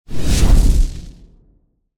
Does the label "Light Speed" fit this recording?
Yes